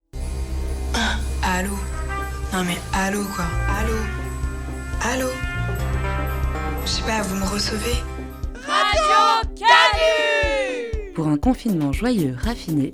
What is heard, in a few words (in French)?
jingle repondeur